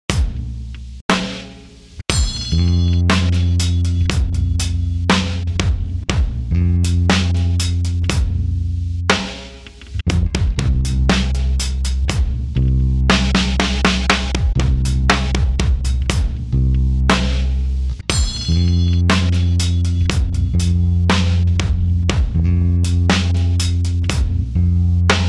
Lazy day bass; Natural vibes

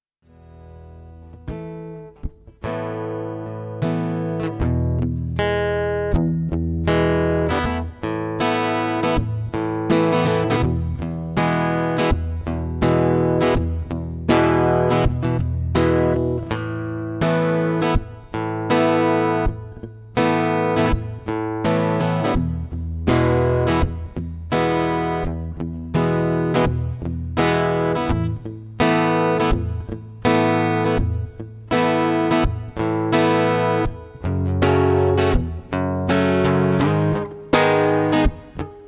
Reason Guitar Mix; Film and TV music volume 2 TRADITIONAL MOODS

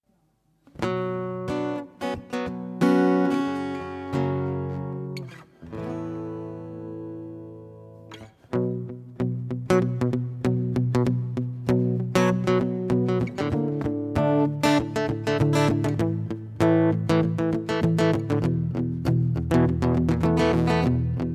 Hope Guitar Mix; Film and TV music volume 2 TRADITIONAL MOODS

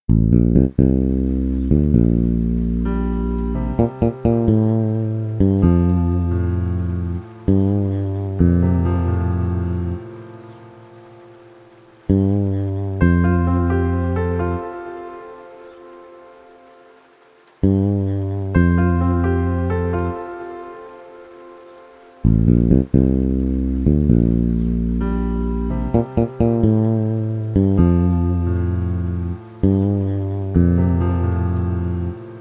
Haunting rain; Library music volume 3 RELAXING

